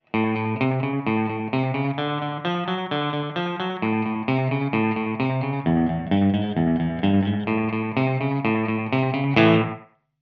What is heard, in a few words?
Rockabilly, echoplex delay settings; Meris LVX